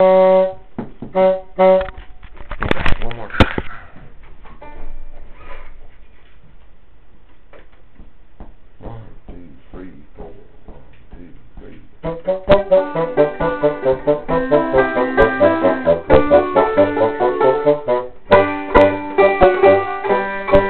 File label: Hornpipe Banjo Bassoon Duet